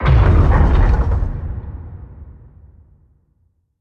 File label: submarines engines